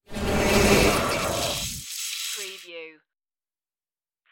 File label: wormhole transformation turn